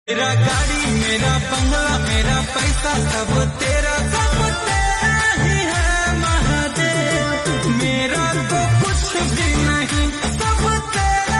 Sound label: Ringtone